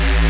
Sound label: Distroy Bass; Ableton Foundation FreePacks; Wicked Kits